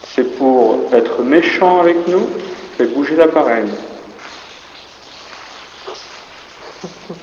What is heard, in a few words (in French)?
rires; evp; fort l'ecluse